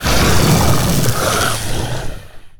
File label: Murkspark Eel Death